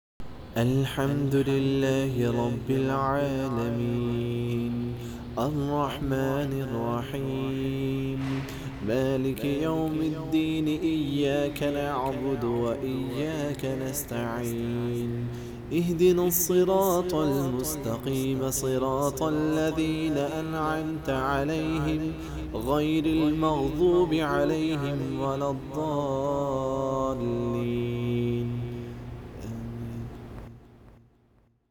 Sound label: echo Tilawat